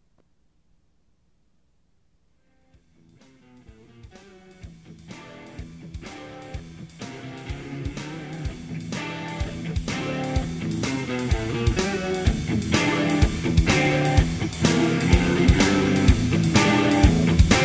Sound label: Riff